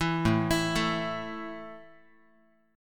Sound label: A 5th A down Guitar 4A,4E,5A,5D,5G sharp,6C sharp, A5 Chord; arpeggiated; JGuitar